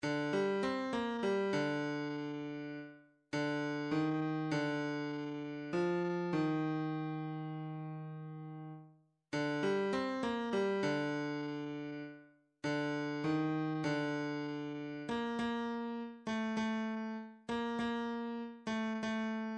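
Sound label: russian polka, midi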